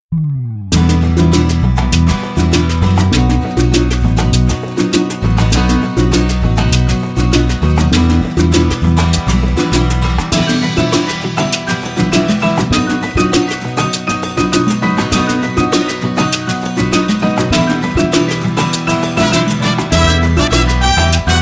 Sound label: Lite Mambo; Latin Samples